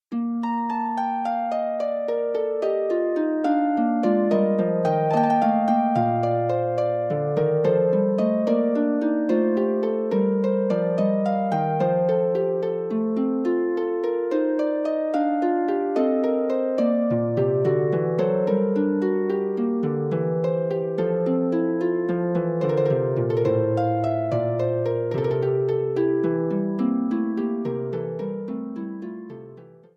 Prelude, harp; Forty Eight Preludes and Fugues Book 2

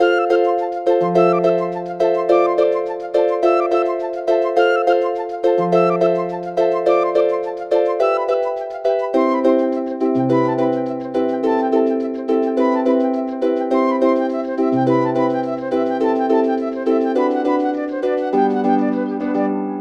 Wedding Music for Flute and Harp toccata

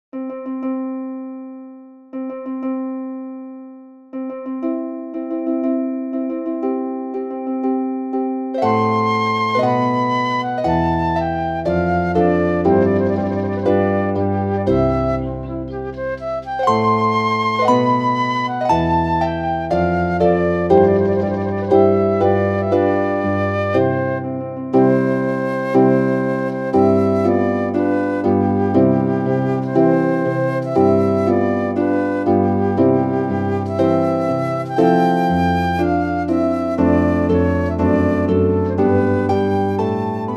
wedding march, flute and harp

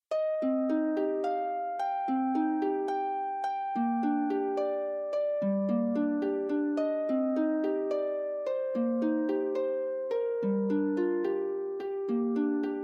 Folk Sounds, harp; Sain y Werin; Cysga di Fy Mhlentyn Tlws; sleep my pretty child

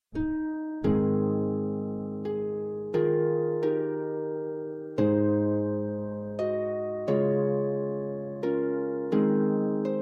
HARP ONLY; Folk Sounds Sain y Werin; Yr Eneth Gadd ei Gwrthod; the rejected Maiden

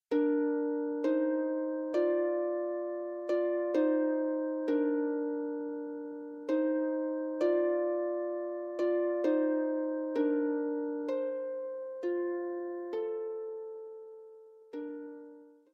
Harp, Lullay; Tudfuls Harp; Si Hei Lwli; Lullay My Baby

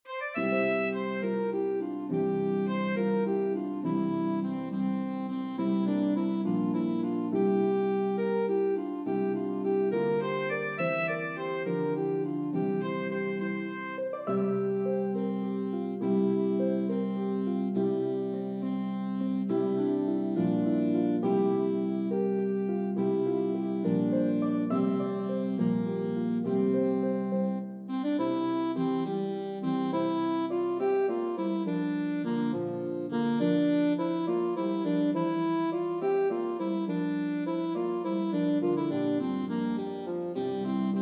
Harp and Melody Instrument in C Saxophone; Banish Misfortune

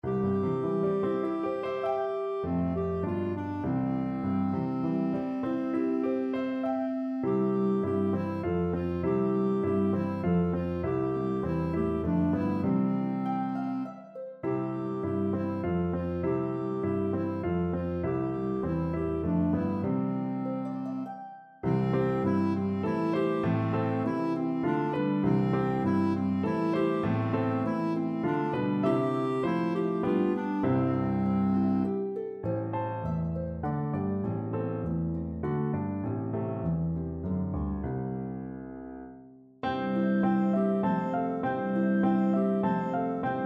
Trio Clarinet; Come Shepherds Rise

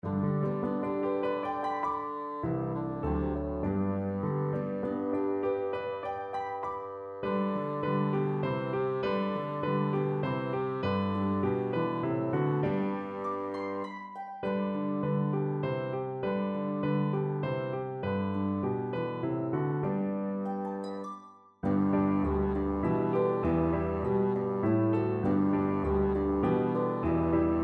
Trio, Bassoon; Come Shepherds Rise

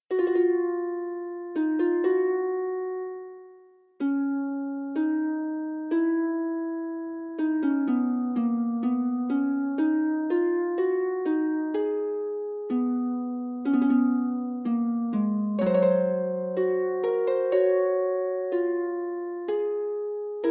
Fugue, harp; Forty Eight Preludes and Fugues Book 2; prelude and fugue no 13 in f major book 2

